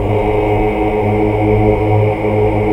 AAH 2 1 R; Best Service Gigapack 1

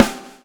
SWNG

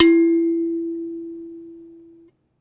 Finger, Prepared Kit; Battery 3 Sample Kits

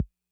Kick Accent Min Tone Min Decay, 808

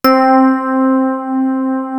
clear piano; East West - Global Sounds; S.I KEYS

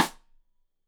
Side Stick Stereo; Deeply Sampled Drums

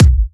Bassdrum

